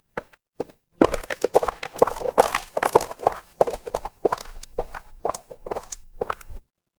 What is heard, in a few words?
footsteps gravel